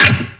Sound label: HARP HIT, GRENADE; Messiah; WEAPON